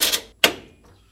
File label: Washer Knob Turn, washing machine